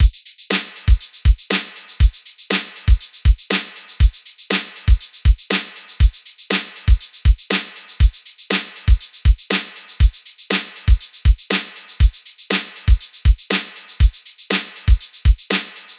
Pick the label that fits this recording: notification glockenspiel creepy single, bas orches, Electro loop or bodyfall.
Electro loop